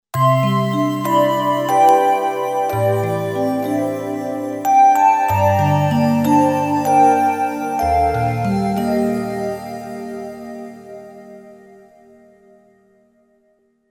Dream Bells

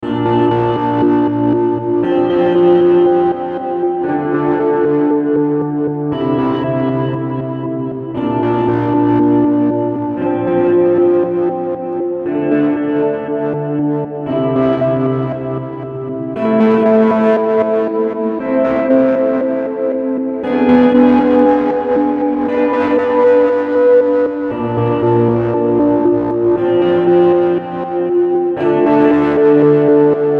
Seymour Duncan Dark Sun

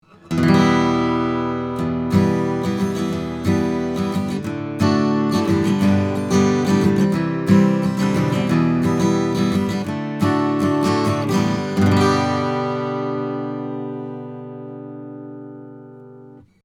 Neumann U87 Fig8 Acoustic Guitar 50cm 15th Fret

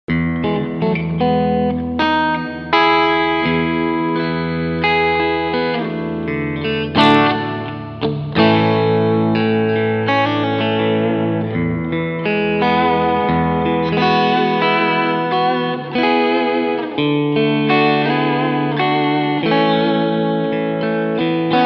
Brit Sansamp Clean Rev

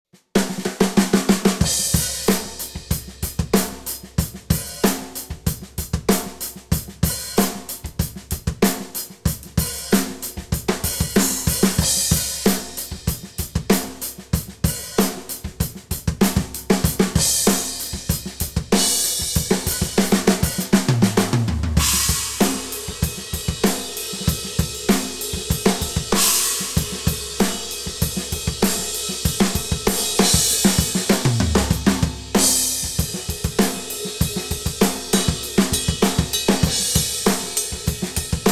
Set 1 + Drums

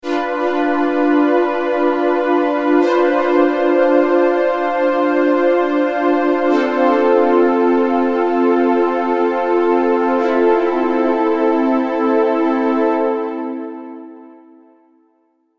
blue Strings

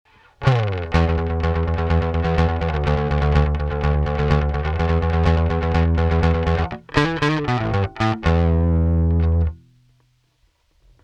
Drive EQ Drive100 Blend50 MF Compressor100; Walrus Audio Badwater

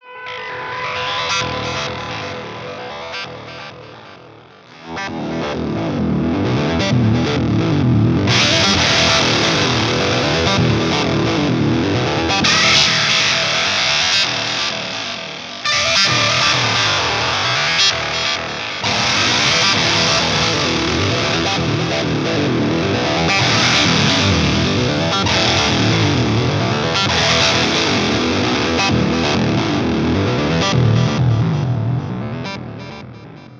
Arpeggiator; Flair

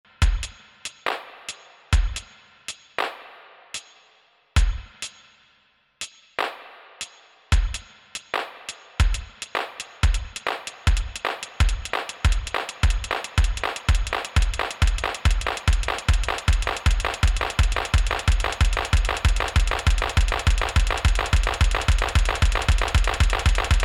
Tip Top Audio Circadian Rhythms Clock

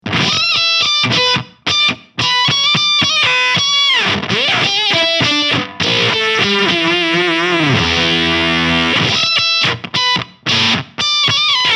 Foxy Tone Box Single Notes; Warm Audio

